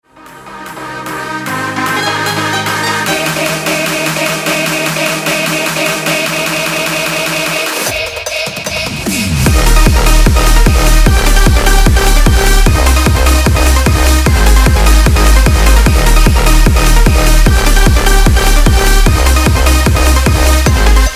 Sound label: BEAT